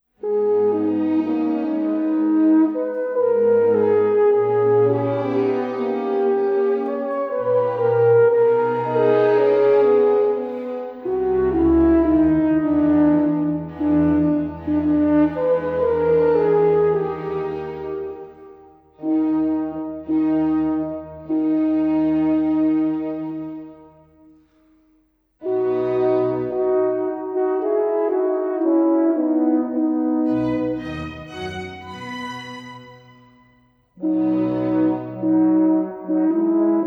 Concerto for Two Horns in Eb Major, Romanza, Cantabile